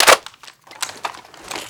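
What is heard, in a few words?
weapon foley mg4 reload clip out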